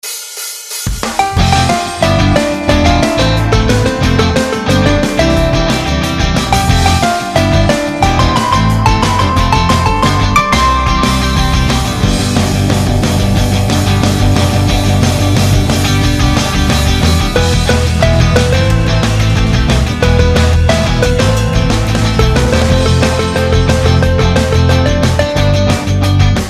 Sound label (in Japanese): 明るい系早送り